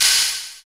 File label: PER PROCU, Procussion